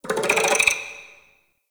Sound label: Interior Spider Clicking; Duviri Murmur